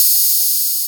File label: volca beats hat open; Korg Volca Beats